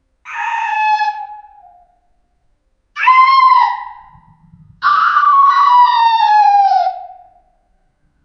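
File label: goblin shrieking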